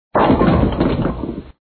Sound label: Clatter, bowling; ten pin bowling